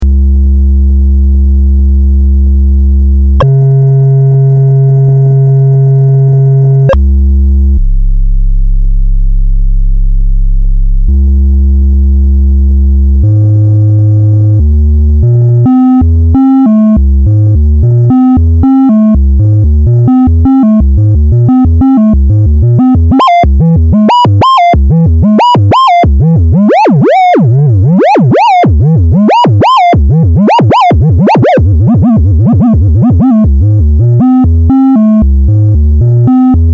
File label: a110 sine; A-110 Standard VCO; 正弦波